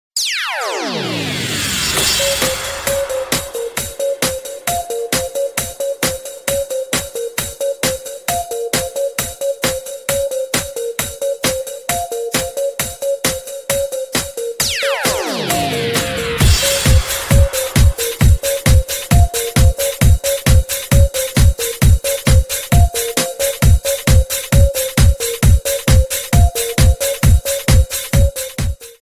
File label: cool music ringtone